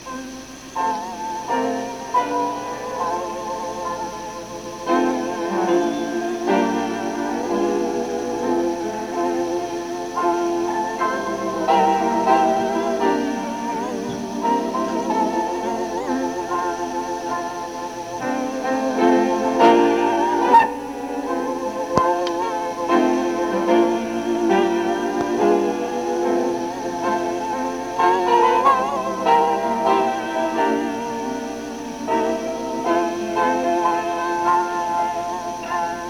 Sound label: piano and organ